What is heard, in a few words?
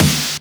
Snares; Vengeance - Essential Clubsounds Vol.4